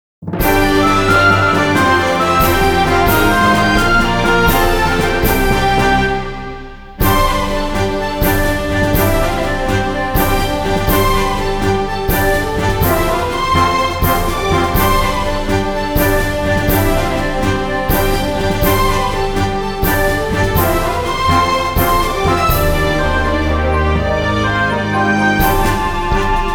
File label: Victory!; Tsugunai; An Cinniuint